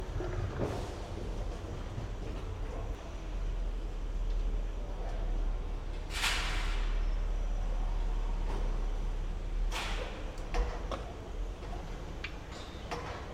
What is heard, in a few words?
Pound